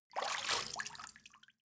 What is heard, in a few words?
step water walk out